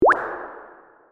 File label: water drop